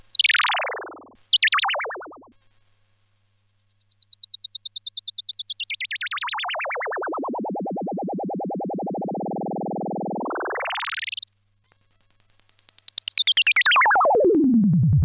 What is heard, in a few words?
MC202 Sub & Res